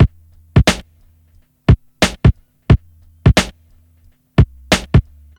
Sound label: 89 bpm drum groove G sharp key